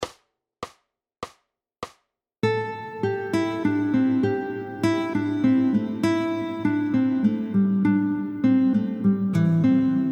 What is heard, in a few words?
Guitar Gym Sequencing oefening